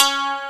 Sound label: YANG-CH'IN; AKAI S6000 CD-ROM - Volume 1